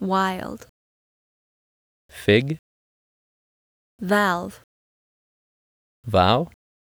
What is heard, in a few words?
without echoes